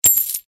コインを入手

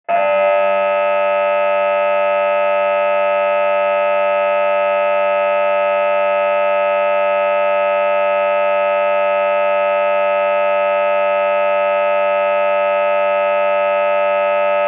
劇場やホールの開演ブザー 15秒 リバーブ有り; 「演劇・舞台」の効果音

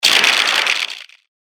ページめくり モノラル

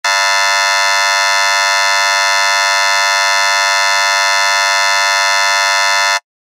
劇場やホールの開演ブザー 長め リバーブ無し; 演劇・舞台

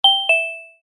場面切り替え ベル

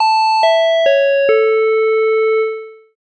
お知らせ・終わり; 戏剧音效

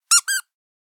おもちゃのボールの音

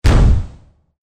カウントダウン

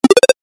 決定 低音 レトロ; モノラル

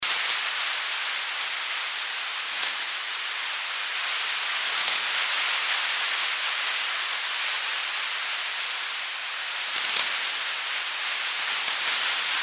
草木, Nature / Field Sound Effects

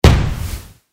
ロケットランチャー 発射; 武器効果音

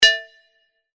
コッ 木琴 音高め

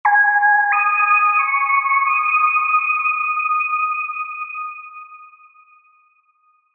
ミステリー・SFな音

